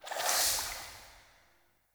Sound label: UI Colour Swap; Verminia